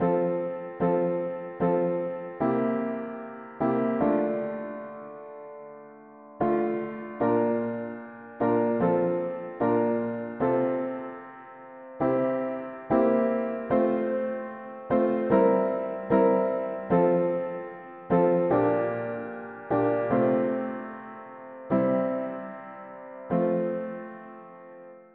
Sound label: mono piano, hymns; Pourquoi crains tu mon ame